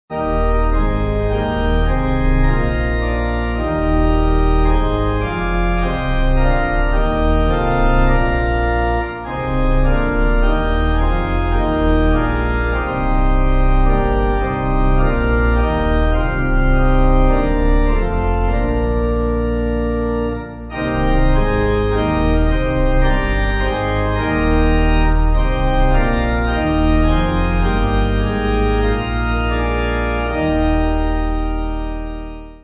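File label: Pipe, Pipe Organ; Once He Came; Gottes Kommen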